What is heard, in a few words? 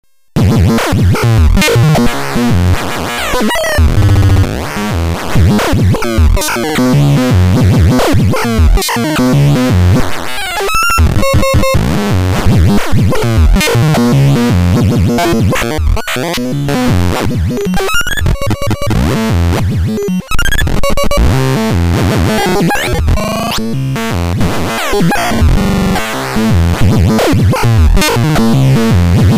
hss softsynth